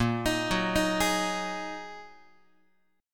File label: A sharp 7th Suspended 4th Sharp 5th down Guitar 4A 4E 5A 5D 5G sharp 6C; arpeggiated; JGuitar